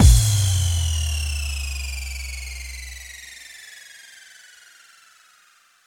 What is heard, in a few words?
bassdrum reverb FX kick; Vengeance Samples